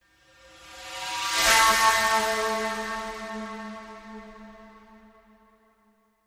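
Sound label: Crescendo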